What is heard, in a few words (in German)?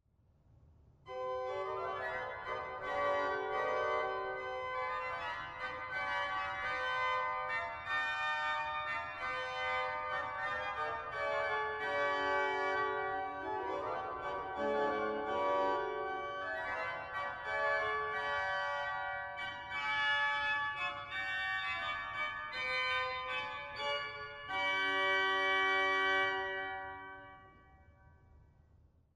Mixtur 4f, organ; dortmund; Sauer